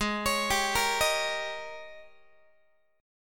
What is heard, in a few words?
Ab Major 7th Suspended 2nd Suspended 4th Ab Guitar 4A,4E,5A,5D,5G sharp; AbM7sus2sus4; x,16,16,17,14,14; arpeggiated; chordshape; JGuitar